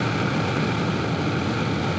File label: pressurizer move